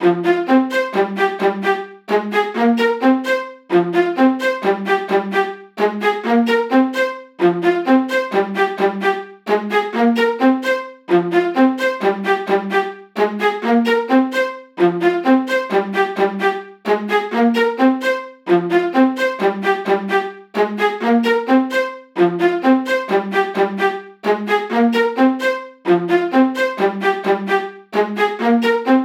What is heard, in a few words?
Strings Dry